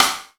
SD, Yamaha RY-10, Drums

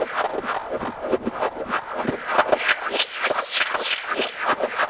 Echo Chuff Splat, Synthetic Pulses

